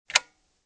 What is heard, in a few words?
SWITCH